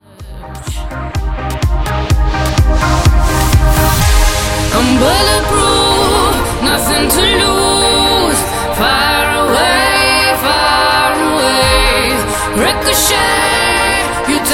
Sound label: edm